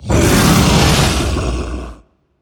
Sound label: wild dragon; DragonsLucky8WD; wazdan